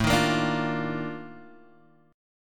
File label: F 13th A, chord